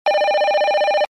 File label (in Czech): telefon